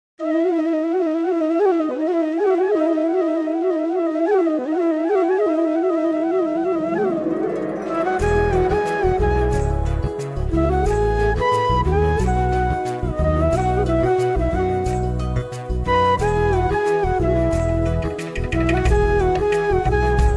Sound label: jugalbandi flute music indian classical music